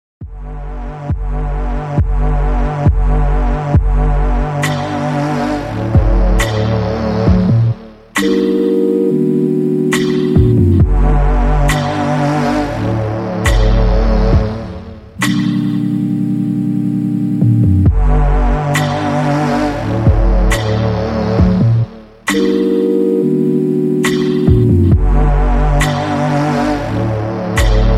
instrumental

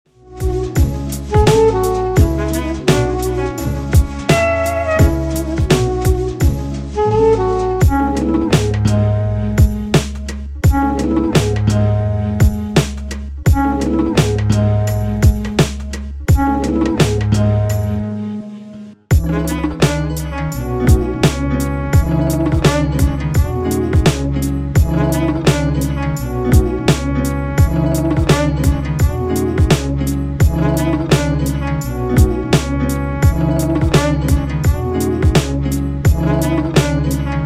Beat

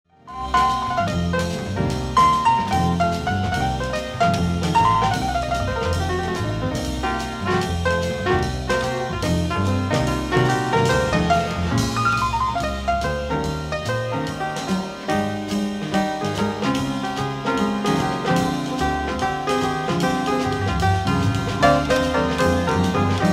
Quintet

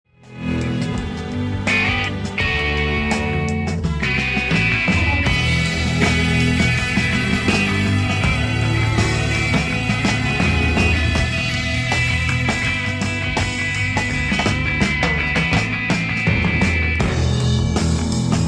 melody

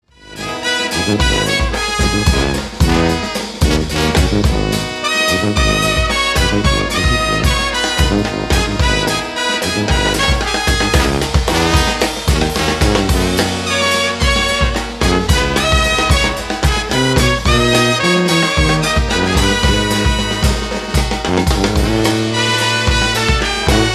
Brass Band

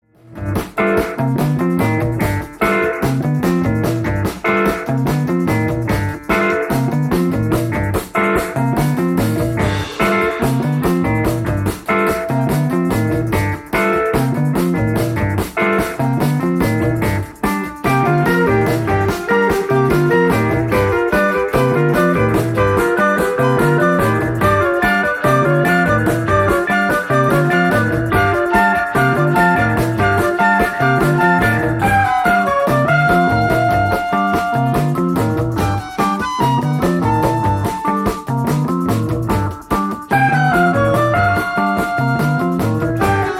Orchestra; Funk Night Records 45

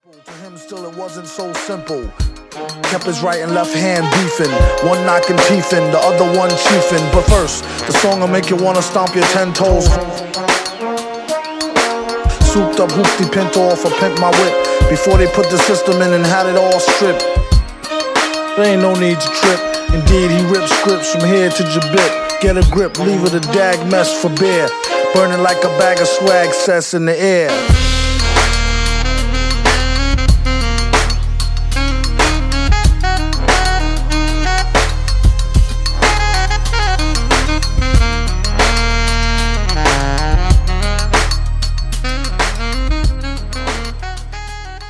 abstract orch air

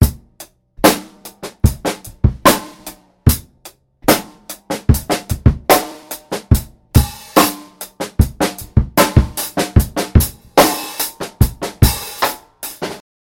74 BPM

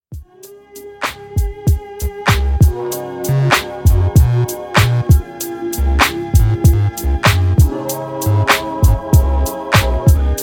Instrumental

